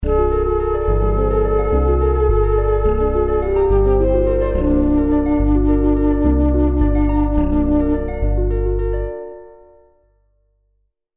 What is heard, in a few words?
Trumpet Song